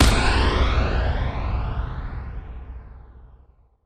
Big Impact Sweep

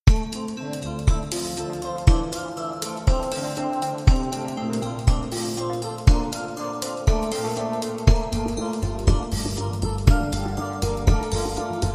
No Melody

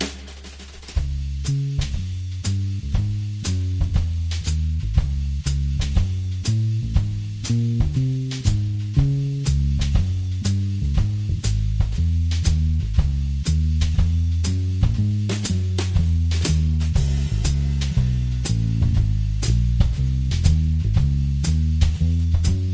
Easy Swing Drums and Bass

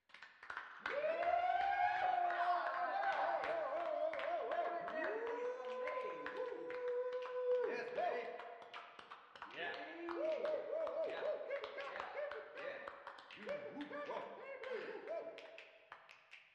Choir

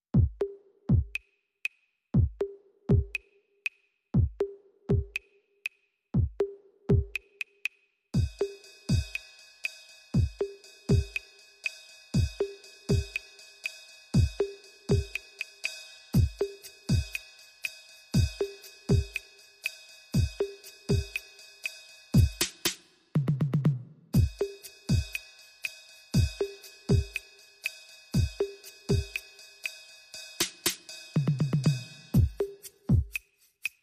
Perc Only

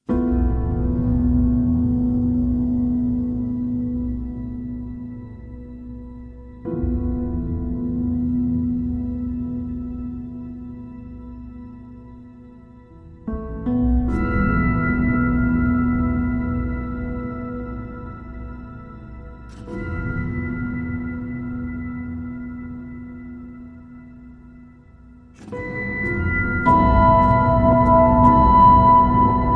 Piano Only

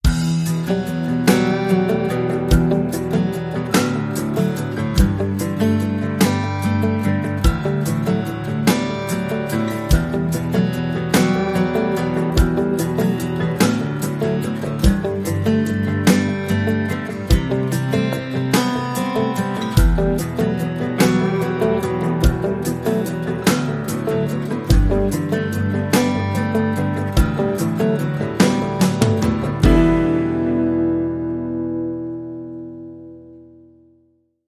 Acoustic

